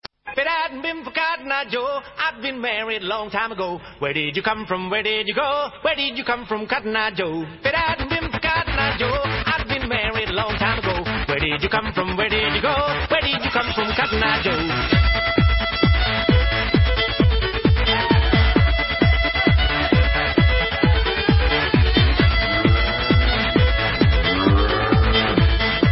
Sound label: Instrumental